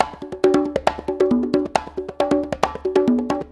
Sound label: Congas, Perc Loops; Sonic Foundry; Drum Tools